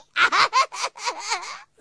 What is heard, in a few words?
japanese laugh